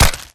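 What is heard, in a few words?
impact bullet generic headshot human; Titanfall 2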